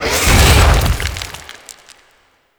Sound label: Death Vocal; Gameplay; Golden Maw; Warframe; The War Within